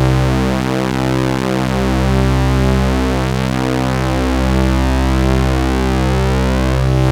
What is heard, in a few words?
low PWM, pads; Zero-G Ambient 1